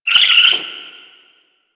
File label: Owl Idle Chirp; Warframe; Duviri; Glyph Puzzle